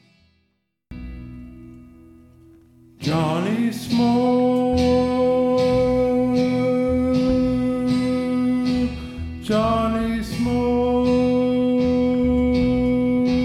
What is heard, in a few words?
Jam; r1 studio sessions